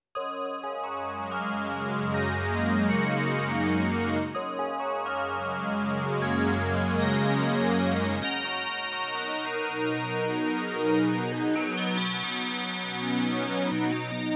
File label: bell pad